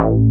JP4 BASS; E-MU Formula 4000 Series Vol. 5; Protozoa; Orbit Instrmt

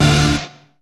HAIL; E-MU Producer Series Vol. 2; More Studio Essentials; Composer; Technopourri

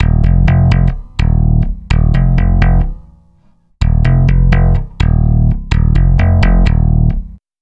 Stick Loop, Stick Bass

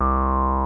TB303; Zero G; Man Machine

